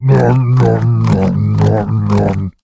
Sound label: Emote Eating Giant